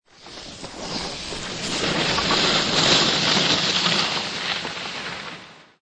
Skiing Snowboarding Coming In And Stopping

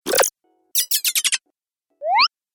Various Game Sounds, video game sound effects

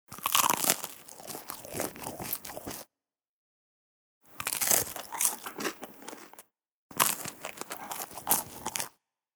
Toast Bites, Biting and munching of toast.; Eating; Human

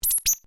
UI Deny, User interface feedback sounds; Ui Set E; Computers; Electronics

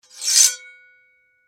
Sword Scrape Blade Heavy; Warfare; Combat

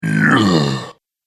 Ogre Growl; horror; Monster Sound Effects

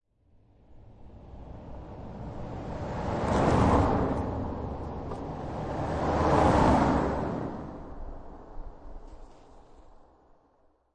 Two Cars By Gravel

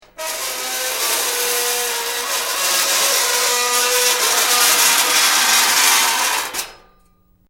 Metal Screech Intense High Pitch; Nasty metal grind screech, ear splitting screeching and clanking

